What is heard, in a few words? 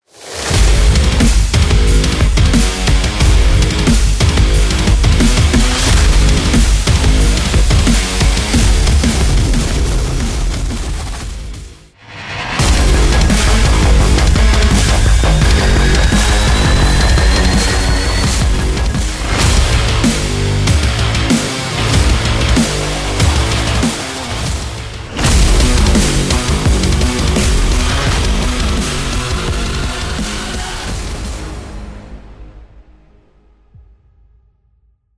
Rock Drive Examples